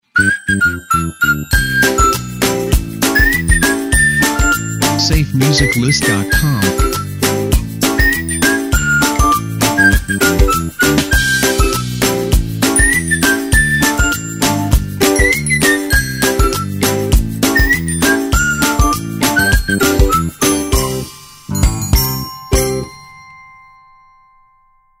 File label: Be Happy Again Intros